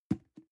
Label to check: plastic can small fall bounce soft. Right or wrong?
Right